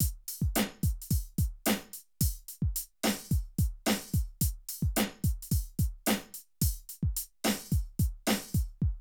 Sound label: Drum loops, Streetsoul